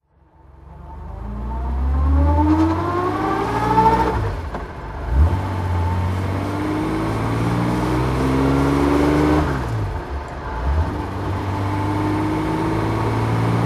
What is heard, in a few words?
aston martin db2-4 mk3 driving